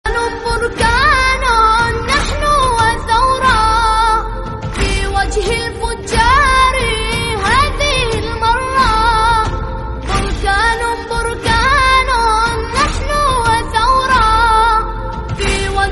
naat sharif islamic

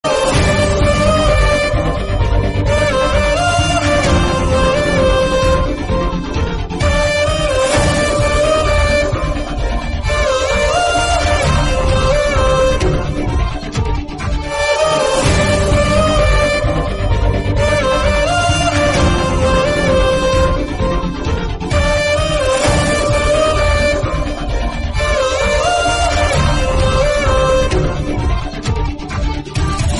bgm; ishq mein marjawan

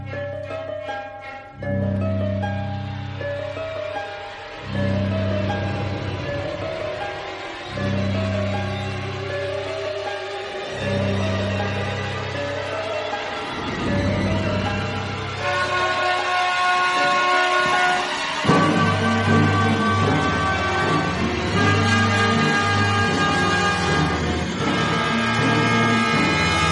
suspense moment

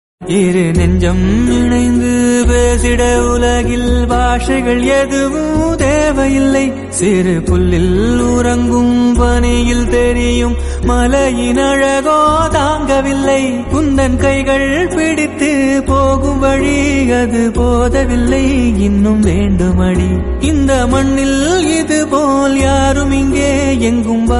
father love tamil song

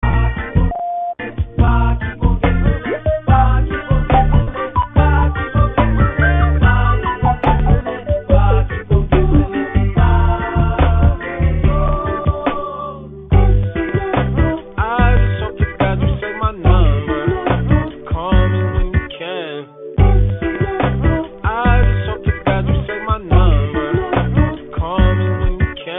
english song

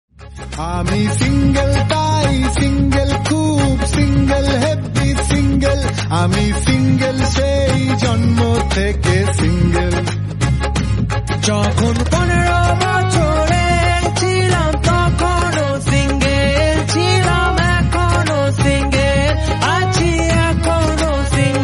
bengali song

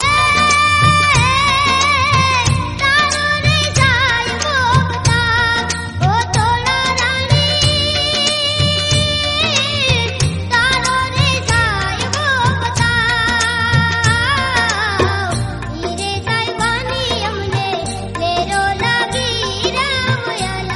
bhajan gujarati; pramukh swami shatabdi

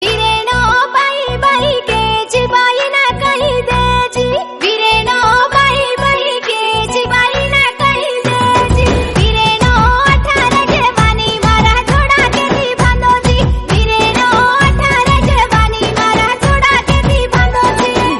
song banjara gujarati

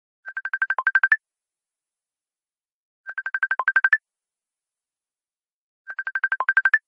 messenger sound message tone notification; facebook

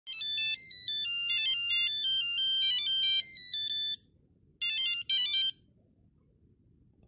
nokia 6210 nokia nokia phone

